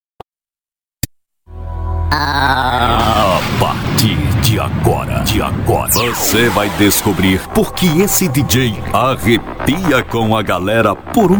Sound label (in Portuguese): vinheta de dj